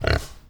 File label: ANIMAL FARM Pig, Grunt, Breathe, Single, Isolated, 0.2m; Pig Farm Animal Groan; Articulated Sounds